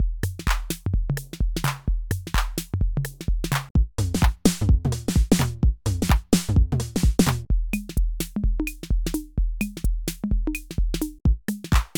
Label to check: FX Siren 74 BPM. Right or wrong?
Wrong